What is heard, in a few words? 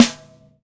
TY Snare, Roland BK-5; Snares